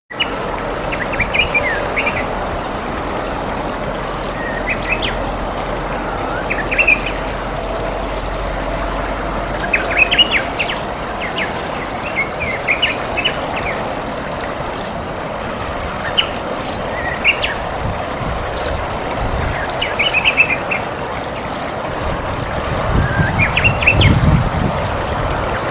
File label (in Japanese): uguisu